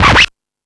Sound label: Discoball, drum machine